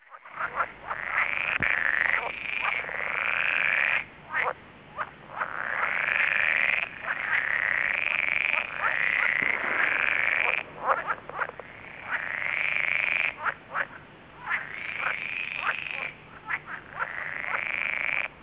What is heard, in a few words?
lessonae